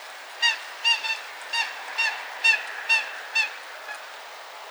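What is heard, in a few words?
Eurasian coot atra flight call; kueborn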